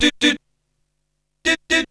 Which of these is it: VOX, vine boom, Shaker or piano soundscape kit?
VOX